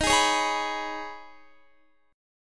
D sharp 7th Suspended 4th Sharp 5th D sharp, Guitar; strummed; 4F,4A,5C,5G,5C,6E; D#7sus4#5; JGuitar